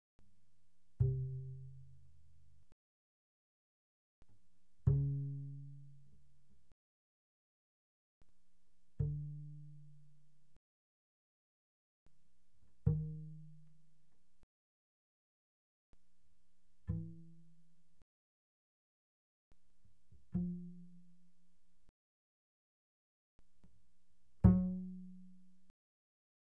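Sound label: Bass pizzicato mezzo forte sul A C3 A3, doublebass; Strings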